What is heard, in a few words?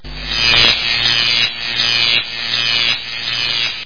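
stun gun working intermittently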